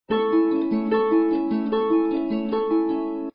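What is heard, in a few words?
icelandic, harp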